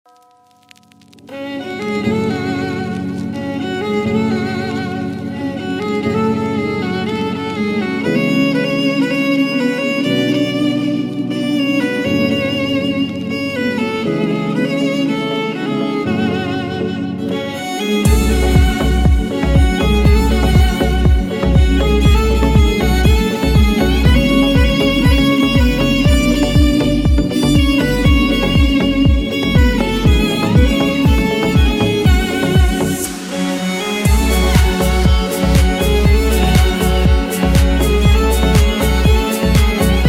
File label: Violin Instrumental